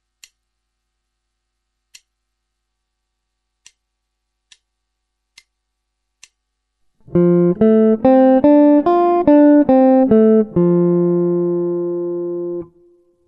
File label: guitar